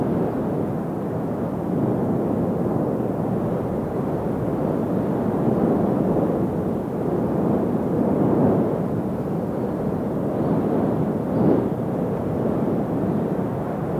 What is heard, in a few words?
Amb Dojo Wind; Fruit Ninja; Android iOS mobile gamerip 2010